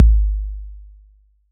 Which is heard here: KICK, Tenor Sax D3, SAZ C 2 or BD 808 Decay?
BD 808 Decay